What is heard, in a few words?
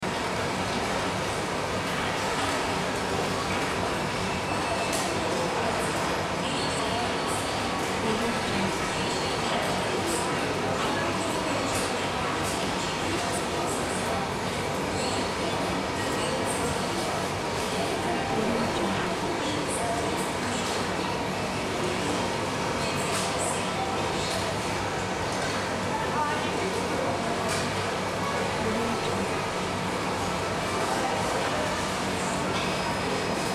ambience supermarket